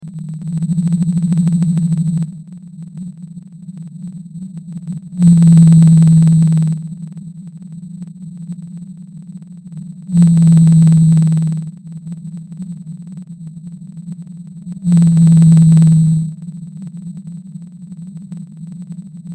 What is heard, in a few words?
Blue Whale NE Pacific